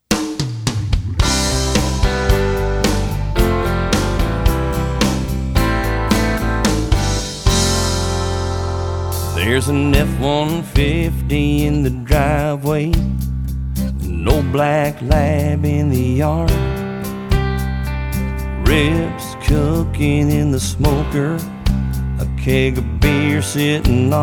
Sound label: country; nashville